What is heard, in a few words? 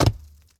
dirt hit; teardown ps5 windows xbox series xs gamerip 2022